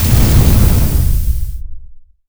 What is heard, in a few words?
ambience disaster hard fire end